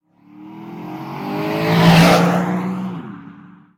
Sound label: car; Blind Drive